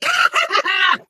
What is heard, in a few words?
ballbot yikes